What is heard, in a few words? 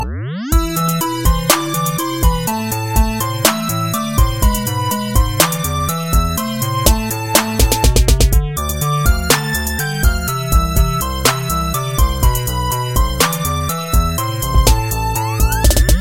Funky Tetris